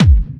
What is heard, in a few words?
BD Clubby, kicks; VEC1 Clubby